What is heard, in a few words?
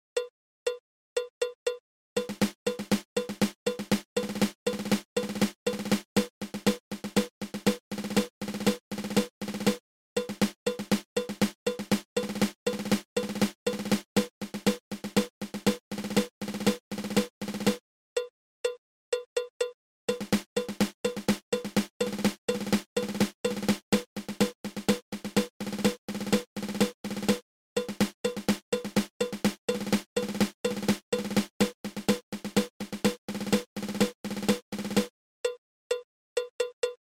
Five Stroke Roll